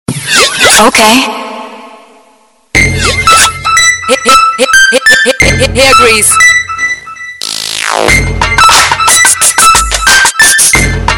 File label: nokia bold remix ringtone